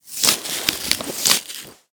bandaging

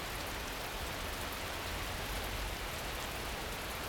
rain heavy loop

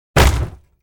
smash table

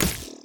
switchblade

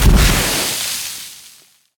skill sandstorm

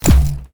watergun

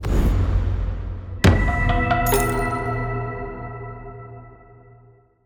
ui draw lots success

